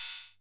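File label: hi hat open, Korg KR-55B Drum Machines; 300 Drum Machines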